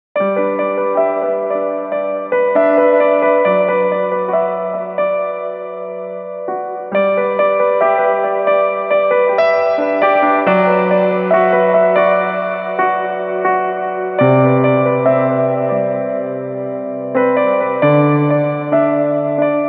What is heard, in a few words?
Piano